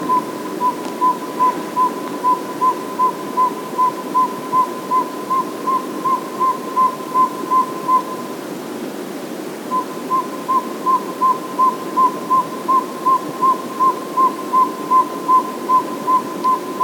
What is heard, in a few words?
Northern Saw-whet Owl Aegolius acadicus